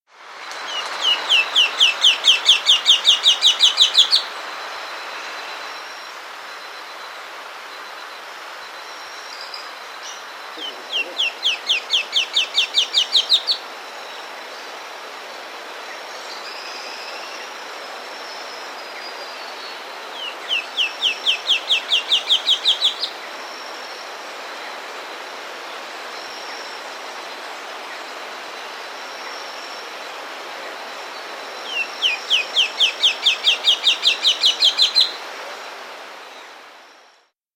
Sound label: Rufous Gnateater; S. America